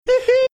funny laugh

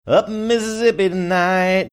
singing up in mississippi tonight

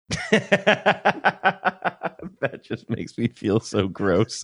laughing saying that just makes me feel so gross

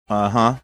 uhh unh

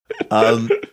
cute end to laugh

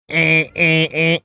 mimicking sound alarm clock going off

